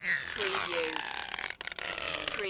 Rubber Band Stretch; sound effects bible

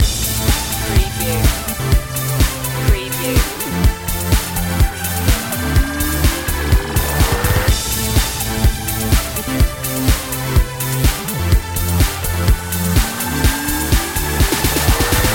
tv loop

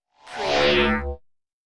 drone slide beam

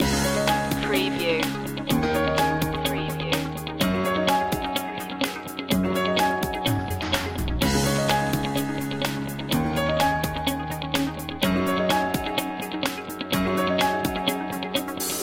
ambition score loop

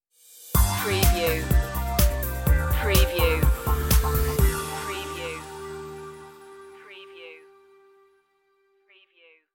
beach side stinger

